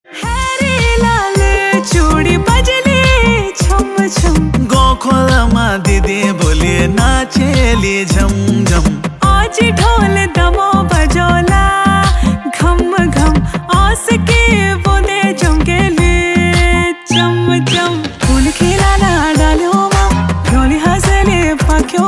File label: uttrakhandi ringtone